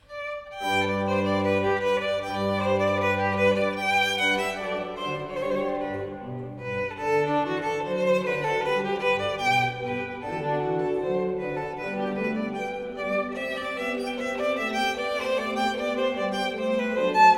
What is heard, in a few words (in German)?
orgel hochz; Violine Orgel; hochzeit; fiocco